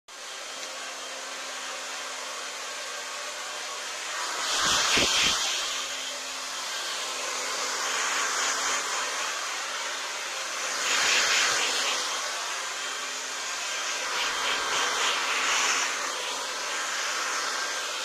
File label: Hair dryer